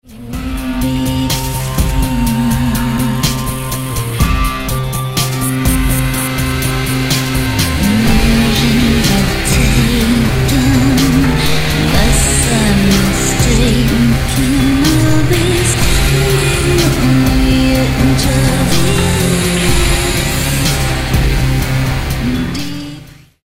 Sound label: shooting laser beams